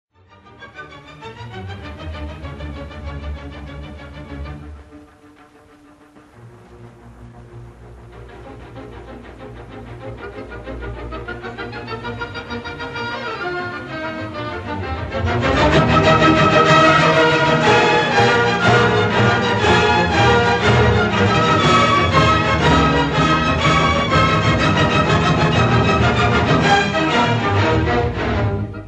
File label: Symphony in E flat major, Scherzo Allegro vivace; Trio; Symphony No. 3; Eroica; Op. 55